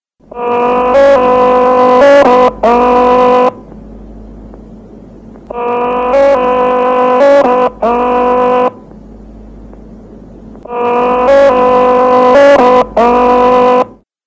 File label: interval signal; budapest1 1926